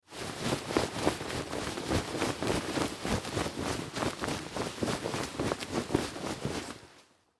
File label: Army Jacket Movement Run Foley; Military; Miscellaneous Combat; Warfare